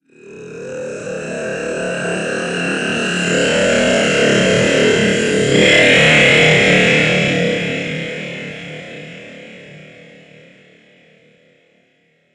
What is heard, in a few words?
Alien Re energizes; Alien Sound Effects